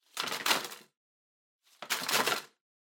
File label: Drawing Box Ticket Put In